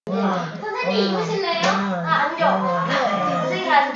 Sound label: hit